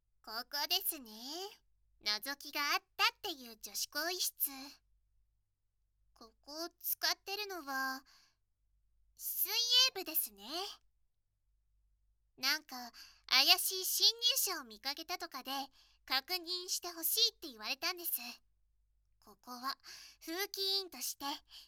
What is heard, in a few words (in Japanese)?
放課後の女子更衣室で二人っきりロッカー耳舐め手コキプレイ キス、耳舐め、手コキ; 火曜日; 音声効果音SEあり